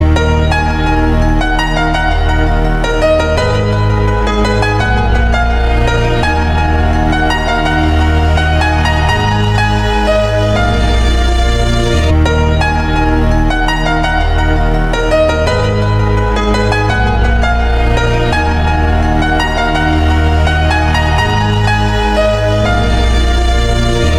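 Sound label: sad melody piano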